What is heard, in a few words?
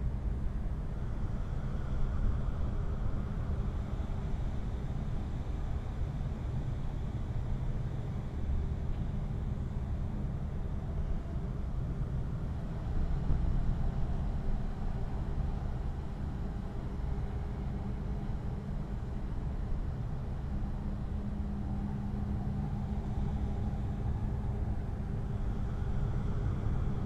Ambient Prison; silent hill homecoming; ps3